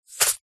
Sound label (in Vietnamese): tieng ran can bang rang va tiet ra noc doc